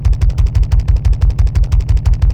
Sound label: Gas Turbine Large Loop; Ambience; Props; Warframe; Corpus Gas City; Props Remaster